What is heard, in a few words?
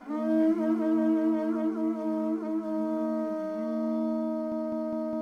Conch Phrase G; Civilization Revolution